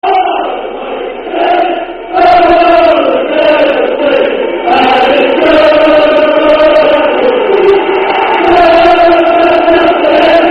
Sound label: Chelsea Chant; Super CFC